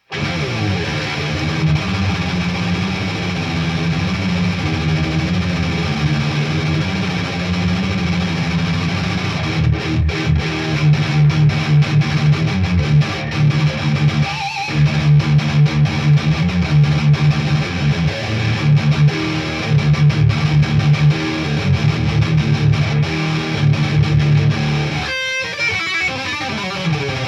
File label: High Gain; pedal; EMMA Reezafratzitz